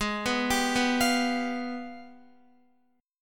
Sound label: Ab Minor Major 13th, down, Guitar; arpeggiated; JGuitar